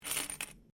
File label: Coin Penny Multiple Move Laminate; Common coins; Money; Objects